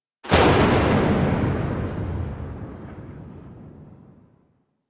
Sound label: Gunblade Tail, Weapons